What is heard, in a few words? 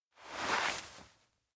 shy shift; anemoi